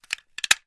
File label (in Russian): zvuki datchika dyma